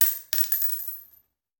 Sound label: Bullet Shell; Sound Ideas; Guns